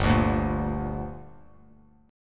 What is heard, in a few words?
G Minor G, Bass Standard; strummed; 3,5,0,3; JGuitar